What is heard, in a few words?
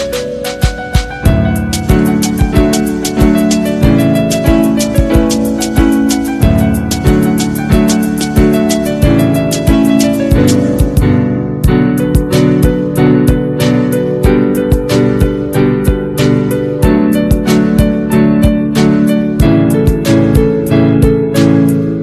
instrumental ringtone